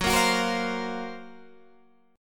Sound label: Gb Major Sharp 11th; GbM#11 strummed